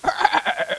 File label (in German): kichern; greenfoot